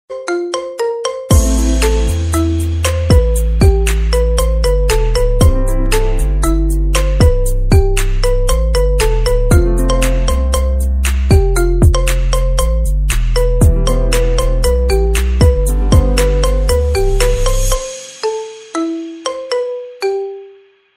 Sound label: iPhone Remix Ringtone, Marimba Ringtone